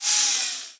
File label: Saw Launch